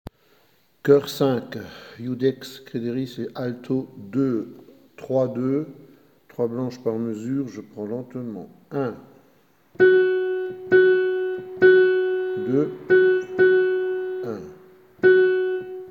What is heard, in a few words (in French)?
Choeur Alti